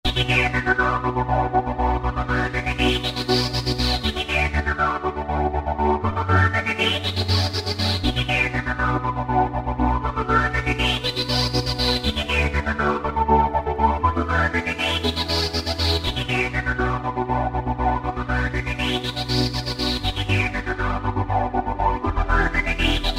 Juno Slice; Roland SRX-05 Supreme Dance

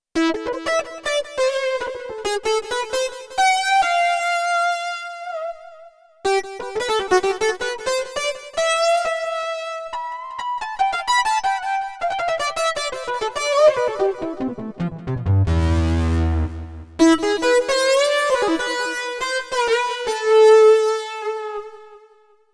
Lead Synth, Roland D-70; Factory Performances

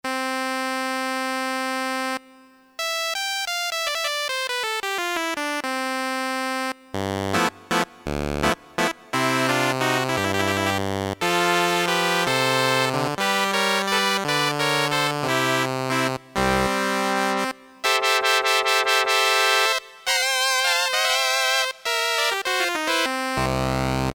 Drawn, Korg DSS-1, Created Waveforms

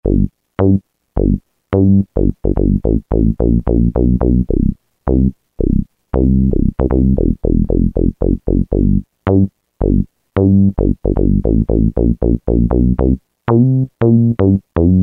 SH101 Bs, Roland M-DC1

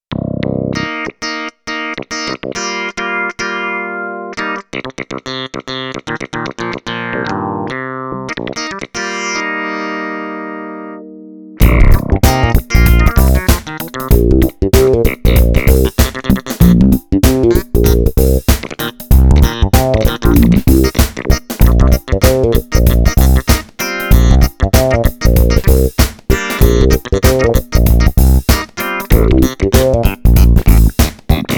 Robot Clav, Korg Radias-R

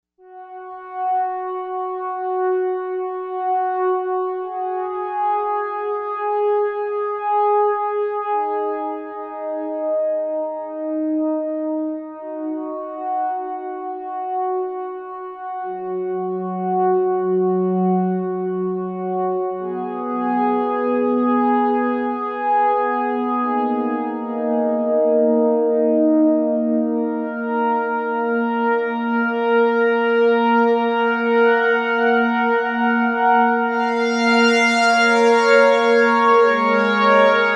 Slow Horn, Novation UltraNova; Factory Patches

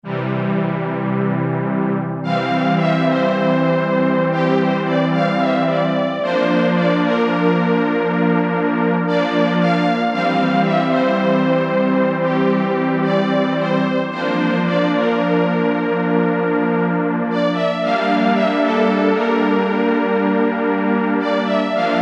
WARM FILT PAD; Akai SG01v

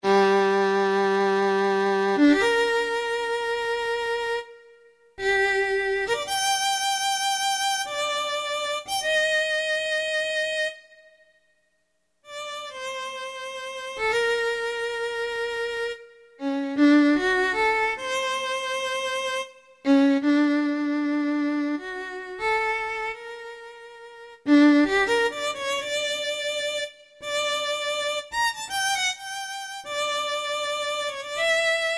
Strings Solo; Strings - Choir

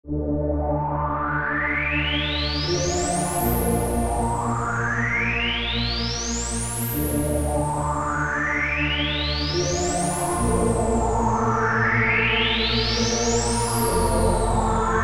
SYNTH TEXTURES - Sweep; Negril Sweep; Apple GarageBand 2

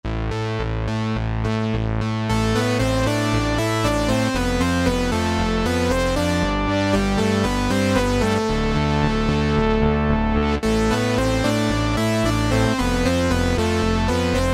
Brass; Cheetah MS6

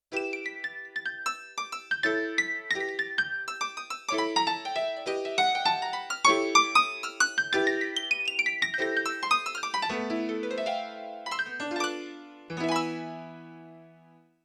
61 Key Dynamic High, Yamaha CFIII Grand Piano; Yamaha TX16W

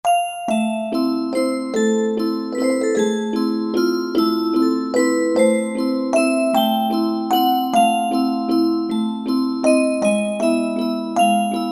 Jewelry Box, Korg N364; Factory Prog demos

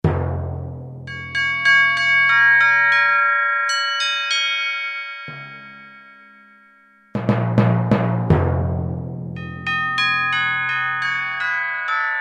Timpani Chimes; Kurzweil K2000 Orchestral ROM

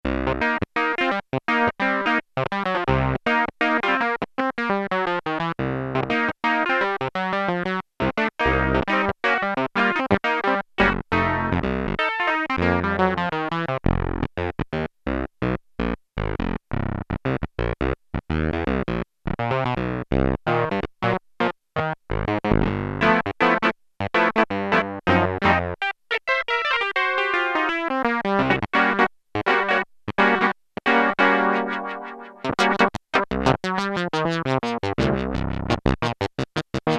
Muted Clav., Prophet-5; Sequential Circuits Prophet-5 (Ver.3); Factory Patch

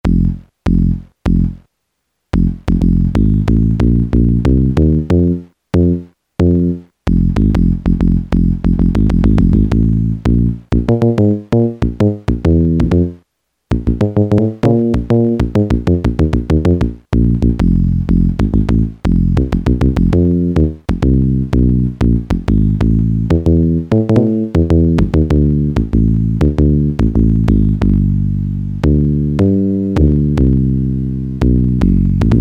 WOOD BASS, Yamaha SHS-10 factory voice

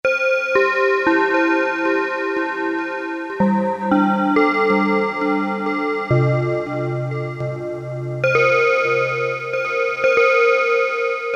CHURCH BELLS, Casio Rapman; Tones

